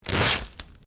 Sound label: Sticky Tape Rip; common tape; Offices